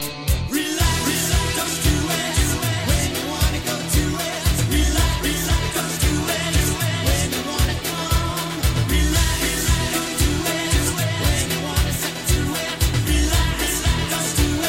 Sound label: 80s dance mix